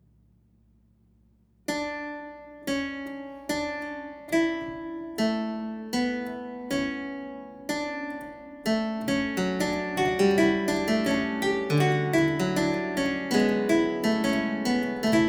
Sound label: for Lute in E flat major; Prelude, Fugue Allegro; Johann Sebastian Bach